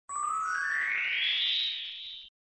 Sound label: Wish sound up; flipper